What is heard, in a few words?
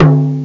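melodic tom; gmplay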